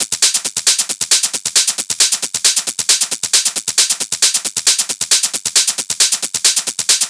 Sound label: Hat Loop 135, Techno